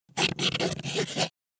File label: Pen writing on canvas short and irregular